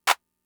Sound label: Clap; Fricke MFB-522